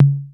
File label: Tom